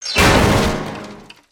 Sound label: Piano Drop; weapons; aronwk collection; ndaudio